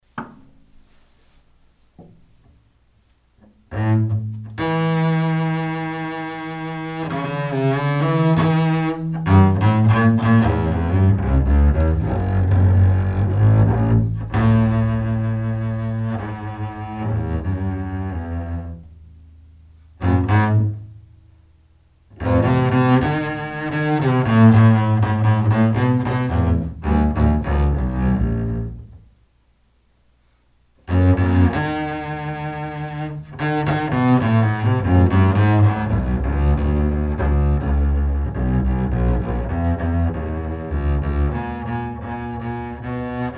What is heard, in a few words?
Bass set; Beethoven